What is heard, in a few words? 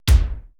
drum, 80s Drum Set, DRUMCOMPUTER